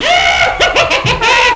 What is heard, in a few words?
laugh